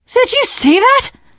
violence awe, teen girl, survivor voice; source engine; left 4 dead 2

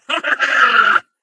ballbot jump short

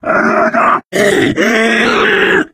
charger lurk, voice idle

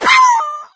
death, infected female; npc; Left 4 Dead 2; Source Engine

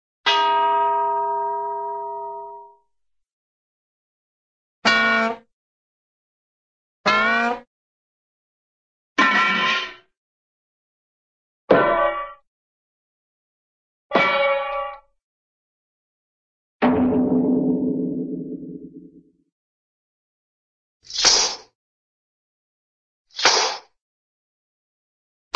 hit, cartoon brake drum hit

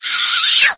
rage, infected female

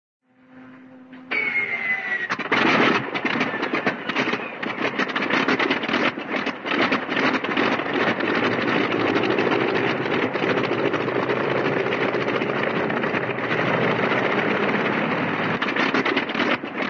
airplane, prop start and ragged idle; cartoon

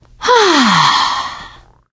pain relief sigh, teengirl; source engine; left 4 dead 2

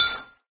throwable inspect ting; tf; breadmonster

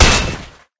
metal gloves hit flesh

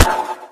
airboat gun last shot; Source Engine; video game; weapons

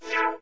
minimize; sound schemes; windows 98; windows 9x

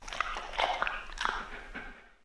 dog lick chops behind wall; ambient; animal; Source Engine; cstrike

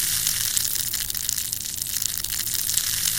headcrab burning loop; Source Engine; video game; npc

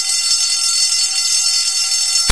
city fire bell loop, alarms; ambient; source engine; hl2

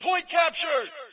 us point captured; ambience; Source Engine; dod

